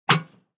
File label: Mailbox is shut; Metal Doors; Sound Effects:Doors